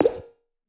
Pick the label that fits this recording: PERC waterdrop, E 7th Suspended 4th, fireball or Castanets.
PERC waterdrop